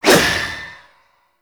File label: Void Dagger Heavy Whoosh; Warframe; Zariman Dagger; Void Mode